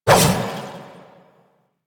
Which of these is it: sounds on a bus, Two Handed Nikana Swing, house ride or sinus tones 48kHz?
Two Handed Nikana Swing